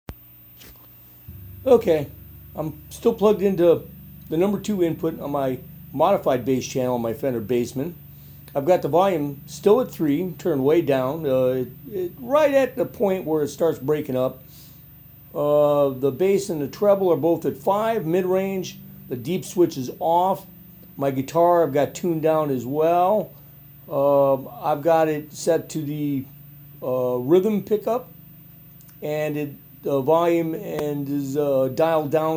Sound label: Bass Channel Modified, Fender Bassman; This mod is the preface to putting in a switchable slope resistor for the bass channel.